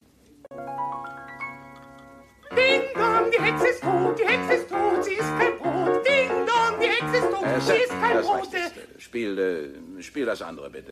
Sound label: dingdong